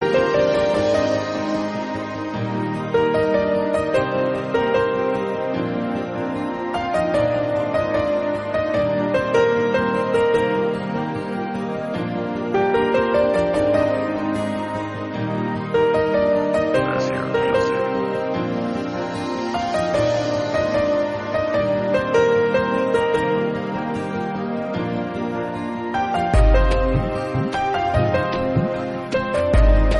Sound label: Instrumental